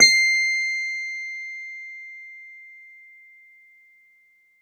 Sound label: Clavi, Digital Keys; Nucleus Soundlab; MK Digital Keys; MKClavi S